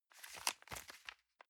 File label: envelope swap soft